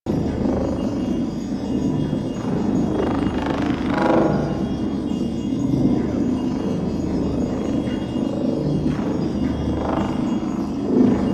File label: Resource Pickup Power Layer Loop; Warframe; Abyss Of Dagath